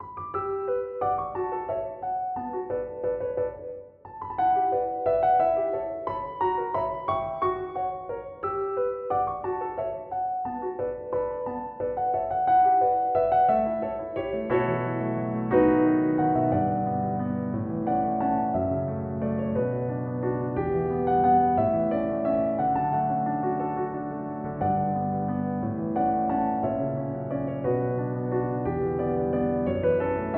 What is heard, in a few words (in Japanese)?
ほんのりいい感じなピアノ; 感動